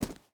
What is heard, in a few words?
footstep boots gravel run; Rust